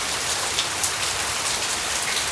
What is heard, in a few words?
rain, ambience